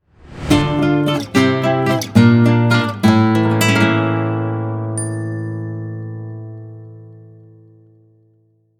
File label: guitar spanish logo